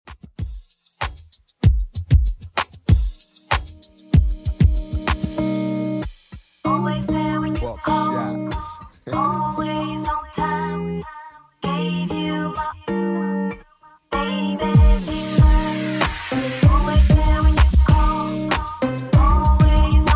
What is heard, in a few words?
96bpm; Redrum